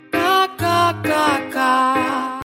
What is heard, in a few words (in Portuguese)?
funk risada